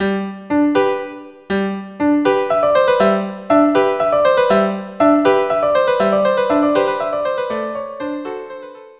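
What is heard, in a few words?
violin violin duo; aragoinaise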